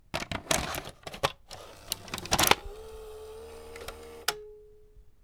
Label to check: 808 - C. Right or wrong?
Wrong